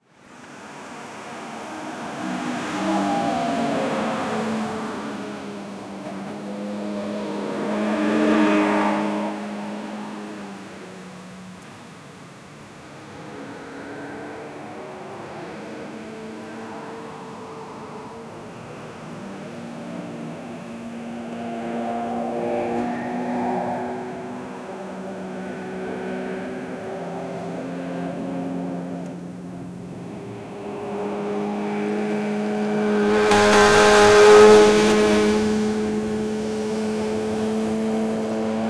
bmw m3; sound effect

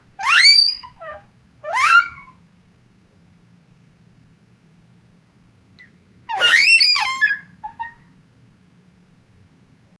bed squeaking; i need bed squeaking; sound effect

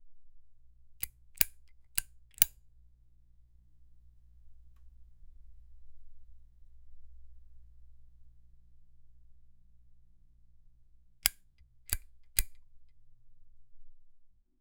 the sound of a lights, blink

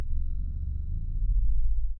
the sound of the energy, energy sphere

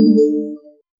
smooth futuristic chill v, neon glow

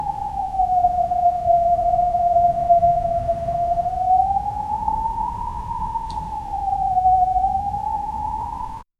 the sound of a cold, town

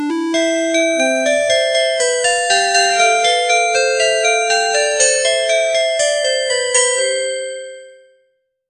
cheerful success chime; achievement

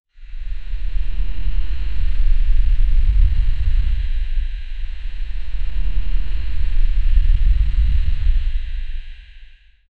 epic power aura glowing f, lightning

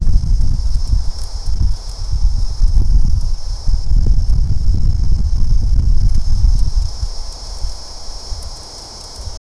ambient noise for halloween

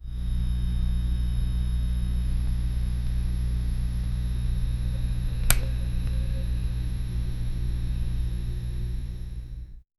turning on an office lamp; lighting

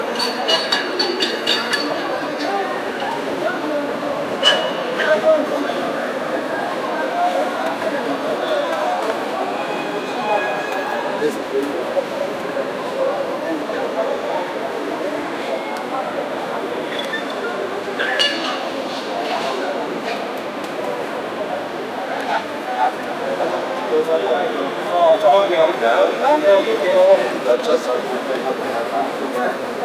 ambient sounds at the old, bartering

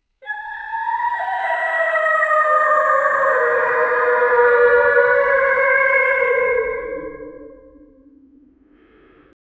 eery evil ghostly high pi, ghost

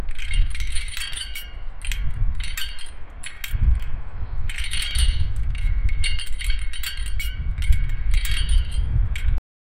an empty playgrond the so, playground

